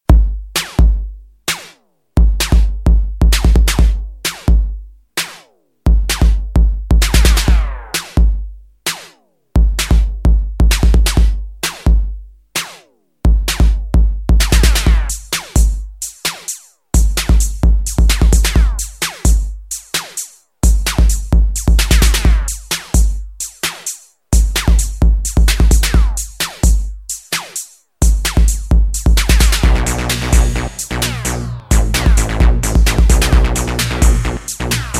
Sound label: Robot Story (instrumental)